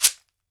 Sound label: SHEKERE, Drums&Percussion; E-MU Producer Series Vol. 7 – Old World Instruments